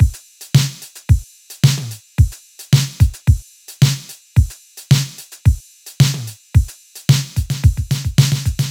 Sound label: Drums Loop only Drums